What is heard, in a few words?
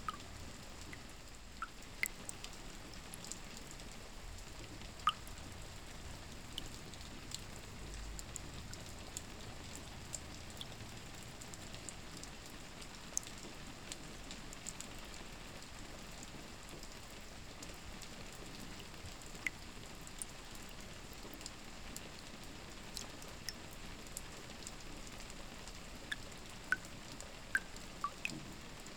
Ambience Rain Soft